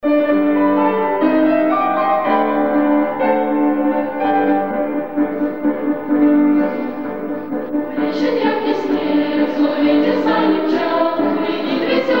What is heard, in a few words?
jingle bells